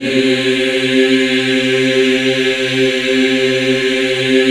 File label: C3, Choir Acoustic & Synth; USB Soundscan vol.28; AKAI